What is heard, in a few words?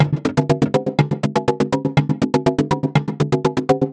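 122 BPM Sequence A major G minor Tonic D; innovative sequences 1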